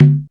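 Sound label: conga low; Back In Time Records - Big Bang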